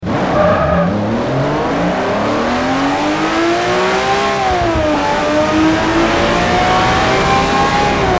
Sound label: first, vehicles; TDMCarpack; TDMCars